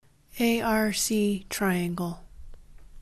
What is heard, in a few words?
triangle